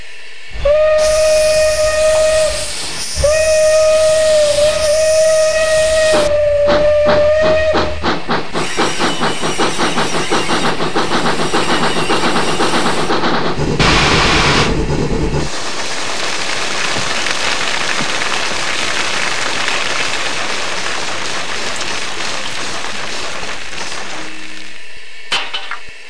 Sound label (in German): Reihe 399 Hoerprobe; Zimo